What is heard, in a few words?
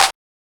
Claps; Vengeance Samples